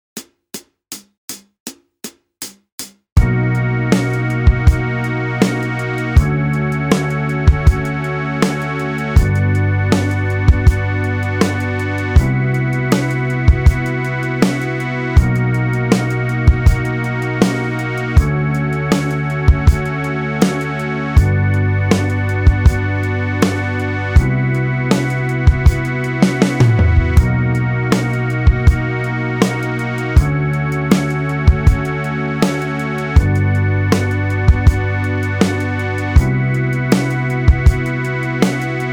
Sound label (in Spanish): escalas BT